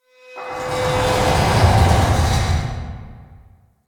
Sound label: Town Large Moving Object, Ambience; Warframe; Duviri